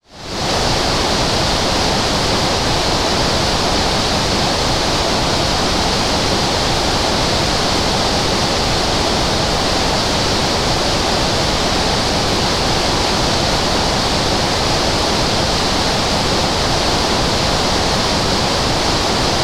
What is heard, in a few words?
rain outside